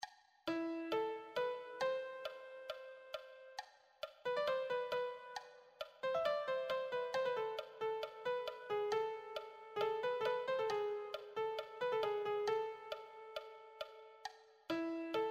lead, piano

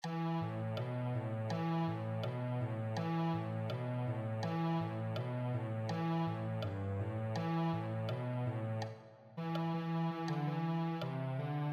cello

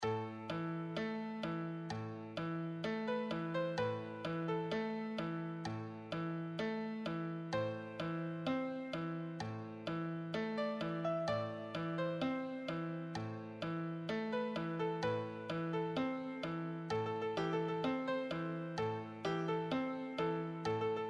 solo, piano

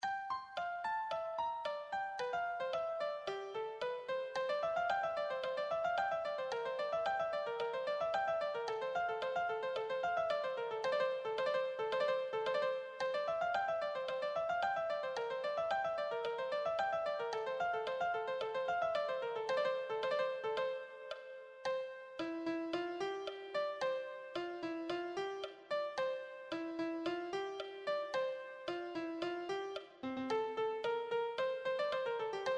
melody

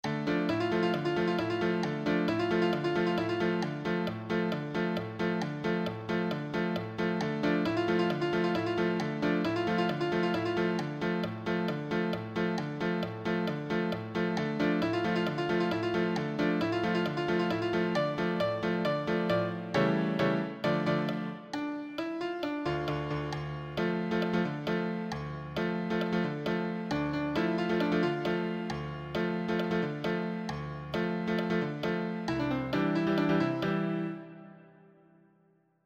solo, piano

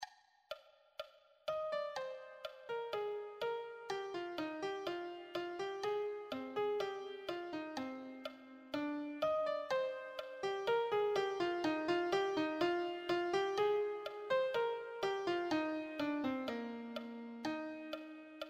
melody

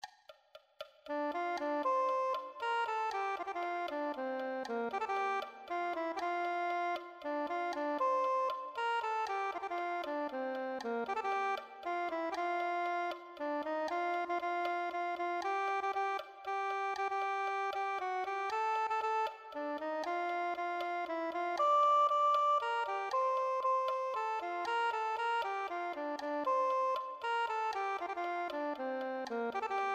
soprano saxophone

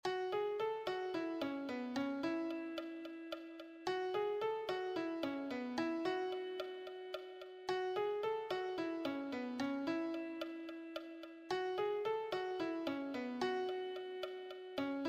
lead, piano

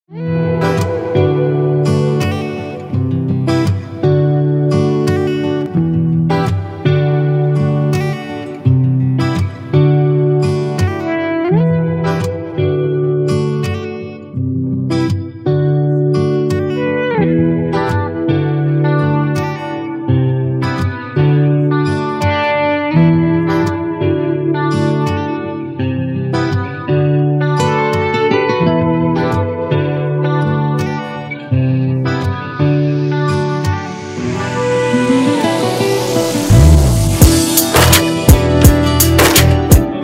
backing track

